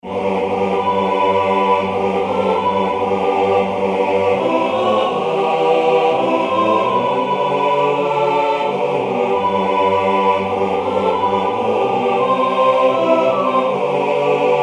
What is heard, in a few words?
calon lan english; Original Compositions for Church Choirs